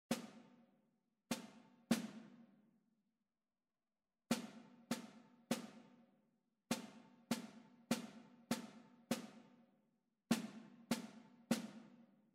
rhythmic error detection

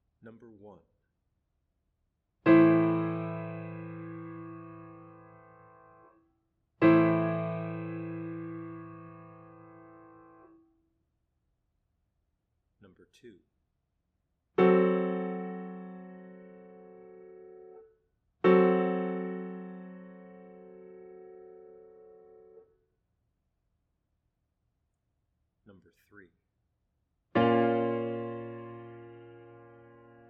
chords; ear training

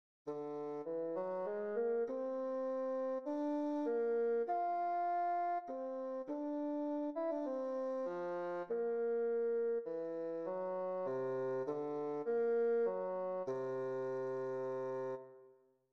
melodic error detection; ear training

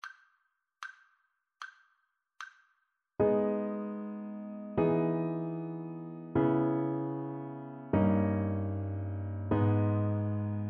harmonic dictation

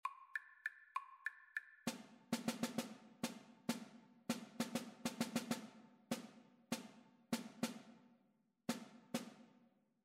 rhythmic dictation; ear training

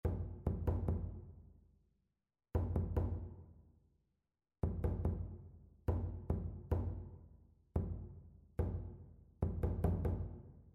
rhythmic error detection; ear training

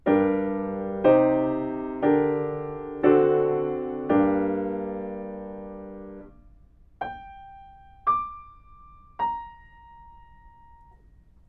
melodic fragments; ear training

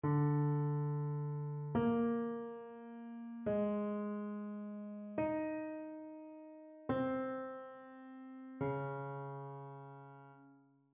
interval chains

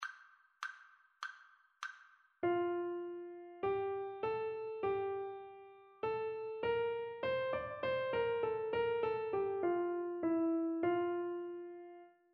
melodic dictation

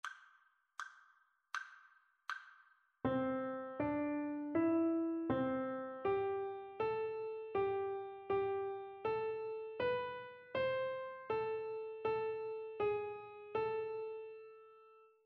melodic dictation

